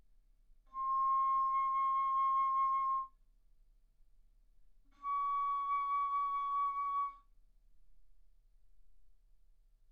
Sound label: Bass Flute ff C6 Db6